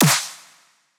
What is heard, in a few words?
snare clippers